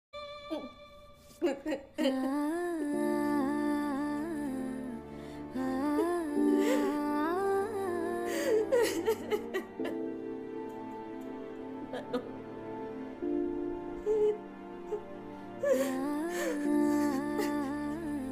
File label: emotional sad bgm; irugapatru; vikram prabhu; shraddha srinath